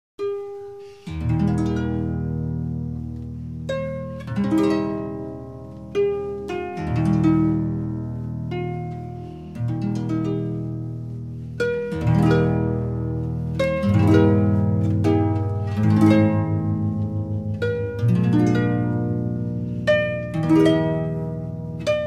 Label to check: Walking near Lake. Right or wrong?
Wrong